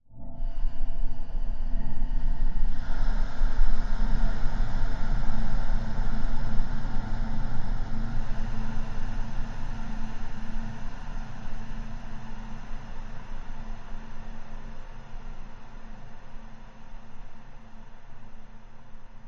horror drone swell atmosphere dark ghostly female breaths; zapsplat